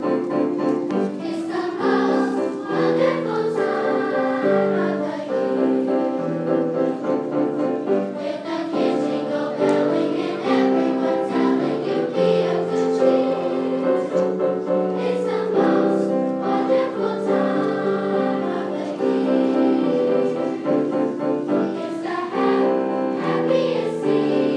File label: christmas chorus